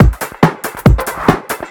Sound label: Breakbeat; Vengeance Samples; VEC2 Loops